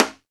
muted rim Timbales; E-MU Producer Series Vol. 2 – More Studio Essentials; Composer; Timbales Xswitch

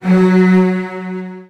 F#2, Virtuoso Strings, 16TH; ILIO; Kirk Hunter; CL 16TH

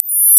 HIGHER ZAP; Rarefaction; Poke in The Ear With A Sharp Stick

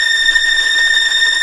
STR TREM, Vlns Tremolo

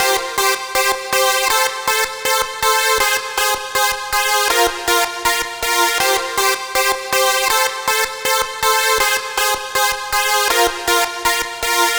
Dance Synths, Hardcore Loops, Synth Loops

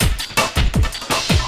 LOOP, 162 BPM, Drum & Bass

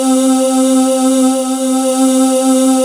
VOX SOFT C; AMG - Now CD-ROM (Roland); Megabass 1

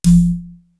SHAKER DRM; East West; Global Sounds

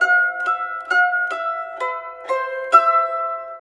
SITUR, SITUR PH; Spectrasonics; Heart of Asia

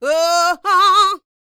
D BIT, Vocal R&B Dance

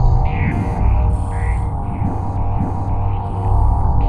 Machine Samba; Sonic Foundry (Sony Creative Software); Ma Ja Le Ethereal Textures

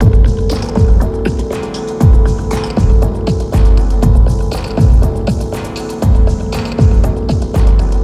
Ambi Groove

